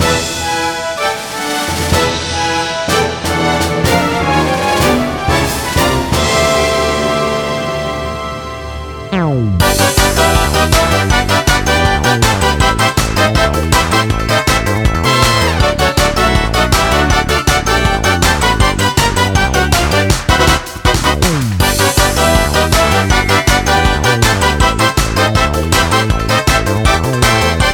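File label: Whimsical Friends; Half-Minute Hero The Second Coming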